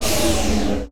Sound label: deactivate